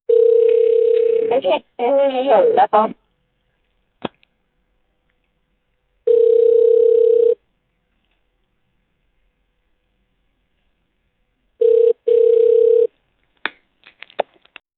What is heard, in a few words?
phone ringing and then so; asmr